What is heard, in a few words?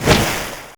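tentacle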